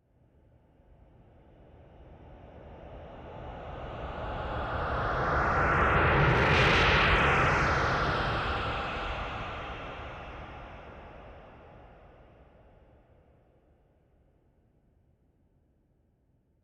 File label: Airplane flying over distant perspective